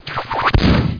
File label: drunk